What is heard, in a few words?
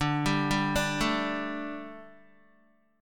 D Suspended 4th D down Guitar; arpeggiated